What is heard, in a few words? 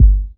Kick hip hop; Sonic Foundry (Sony Creative Software); Ugly Remnants VOL-2; Beatstuff